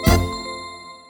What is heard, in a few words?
collect end; Oktoberfest